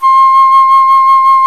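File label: Alto Flute vibrato; Roland L-CDX-03 Disk 1; A.Flt vib 2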